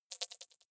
click erratic wide buzz, signale

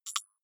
click hard high

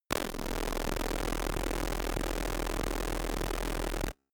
distorted noise, sciene fiction

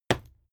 fight impact sweetener bright; krieg-waffen; nahkampf; soundpool

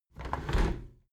window handle squeak turn close halfway regular; objekte; fenster

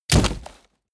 body fall wood harsh crunch; krieg-waffen; nahkampf; soundpool

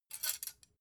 dough hook grab; industrie-wirtschaft; gastronomie

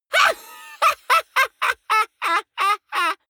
laughter lunatic; vocals; comedy-cartoon; soundpool

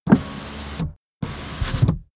car window front close unsteady

